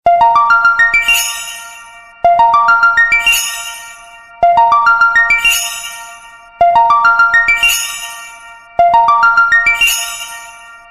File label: huawei magic, ringtone